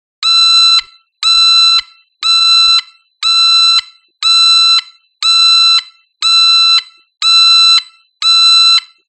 Beeps High Pitched Hi

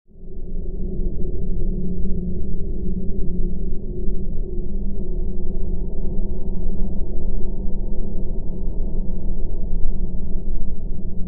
Ambiance, horror

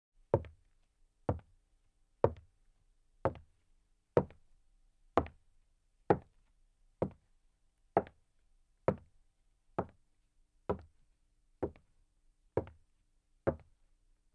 Footsteps On Hollow, wood

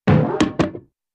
Bounce Boing Hits

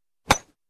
Baseball Bat Alumin; baseball fx & games

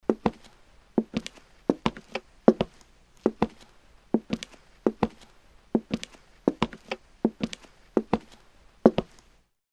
Footsteps Female

